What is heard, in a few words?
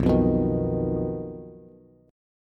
B Major Flat 5th B Bass; BMb5; strummed